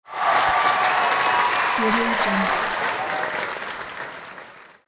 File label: cheers and applause